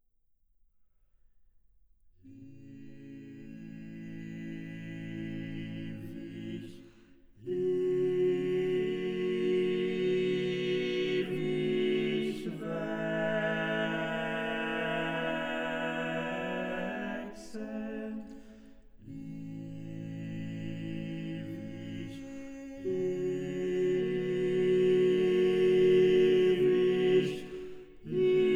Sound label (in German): Gesang; Schubert